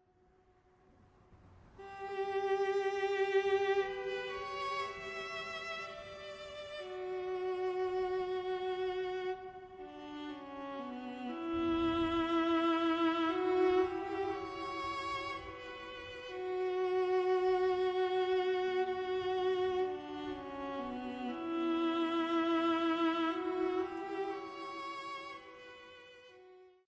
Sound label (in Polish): muzyka bez oplat pozytywka